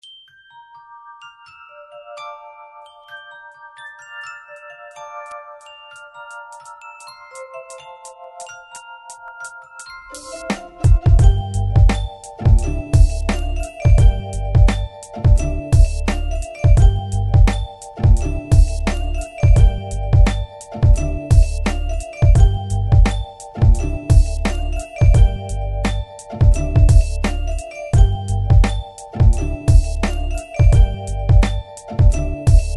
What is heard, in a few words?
Beats